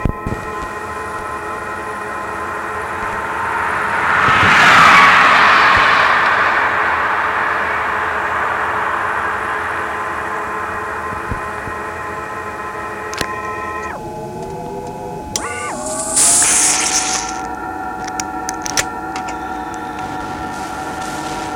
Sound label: train beer